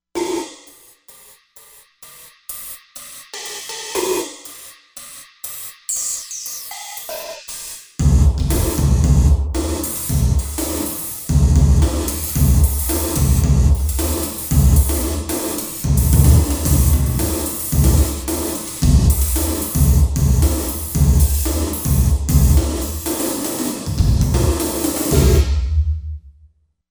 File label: Dynamite DS, Korg MSC-07S Synth combination; Korg MSC-07S Synth 2